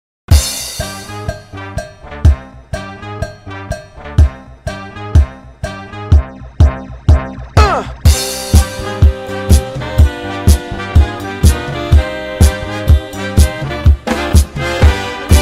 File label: cha-cha-cha, orchestra; remix